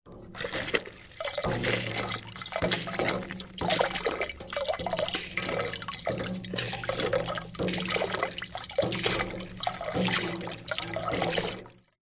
industrial pump hand pumping water small metal tank; zapsplat